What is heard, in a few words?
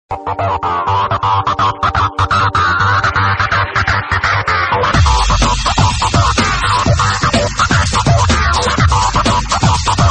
samsung classic ringtone